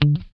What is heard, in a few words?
GUI D3, E-Gui Muted; Sound & Vision; Gigapack I CD 2